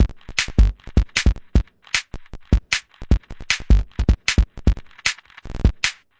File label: grainy breakbeat, drum loops and breaks; 141 to 160 bpm